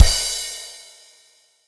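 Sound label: Sixteen Beat Crash Kick; Roland SPD-S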